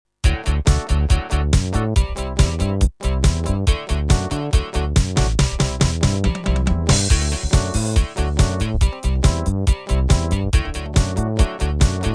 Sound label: ska